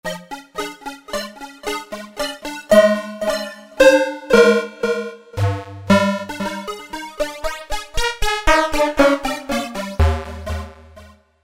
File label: Analog Sides, Roland V-Synth; Factory Patches